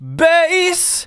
vocals sung F#; Techno Trance Essentials

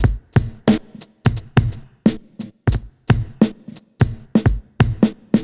Drums; Sonic Foundry; Stylus Pressure Urban Grooves on Digital Wax; Back Forth

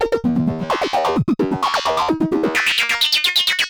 Android Arps 130BPM

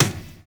analog fi; Univers Sons - Kitch 70s

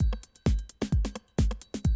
130, Classic DrumLoops

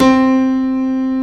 KLAVINS C4; East West - Global Sounds

